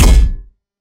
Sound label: shoot shotgun